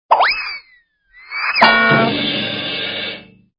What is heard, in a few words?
Wiz Klonk